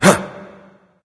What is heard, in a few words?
grunt jump; smite; thanatos